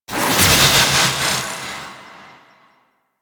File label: Regen Ability; Warframe; Sentinels; Abilities